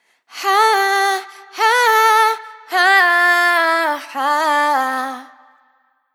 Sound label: riff Breathy Run Ha 105 C wet, vocal; Slate Digital Omnivox Vocal Sample Pack